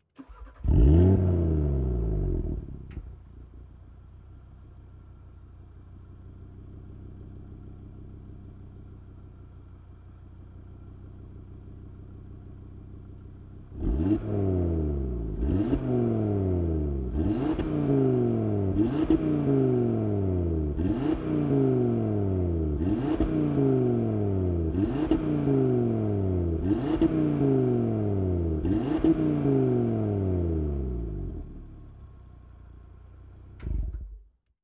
Audi TTRS engine on idle revving engine off exterior